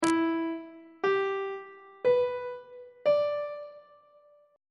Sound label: f flat phrygian 7th chord 1 is f flat minor 7th on treble clef